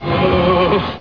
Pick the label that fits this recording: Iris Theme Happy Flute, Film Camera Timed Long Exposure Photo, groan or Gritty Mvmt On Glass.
groan